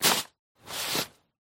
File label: shoveling snow